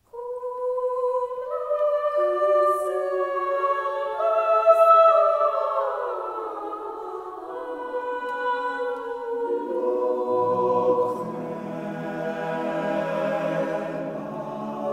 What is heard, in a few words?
motet; Orlande de Lassus; Cum essem parvulus